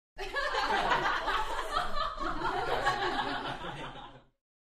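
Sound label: Crowd 7 Single Medium Burst, female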